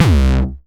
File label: 909 Grime Layer C2, Kicks; Drums; Mbase 11 Kicks